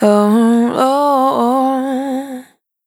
Anna Banana Dry, Vocal Samples, Single Voice Melodic Loops; Singles